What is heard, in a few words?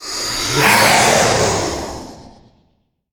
Shadow Manifestation Attack